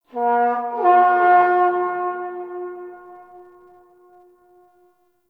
Battle Trumpet Horn Call; Warframe; Tau

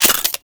Pistol Mech; weapons; Warframe; Grineer; Orokin

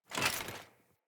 Foley Action Armour Dodge; Player; Deora Armour

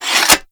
Hammer Sheath; Warframe; Foley

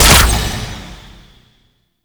Short Bow Fire; Warframe; Duviri; Dax; Weapons

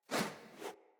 Great Sword Whoosh Accent Air; Weapons; Player; Swords; Mendicant Great Sword

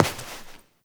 Step; Warframe; Koumei

